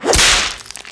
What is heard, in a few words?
whip hits flesh; DAoC